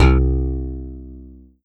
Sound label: Roland PN-D10 Slappin Bass C2